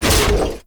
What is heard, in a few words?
Thumper Bot Thumper Pre Impact Mech; Warframe; Grineer; Movement